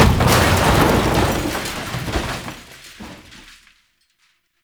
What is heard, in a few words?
Desk Destroy; Ambience; Gameplay; Warframe; Entrati